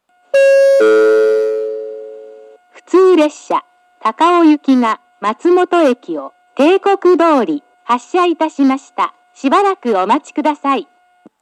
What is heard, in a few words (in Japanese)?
minami matsumoto 2 bannsenn matsumoto hassha